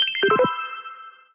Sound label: usb connection sound